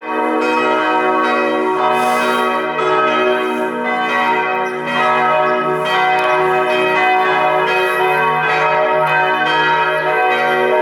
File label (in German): Gelaeut